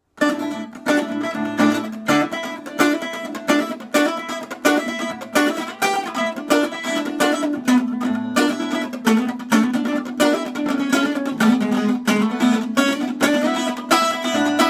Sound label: Texas 12 String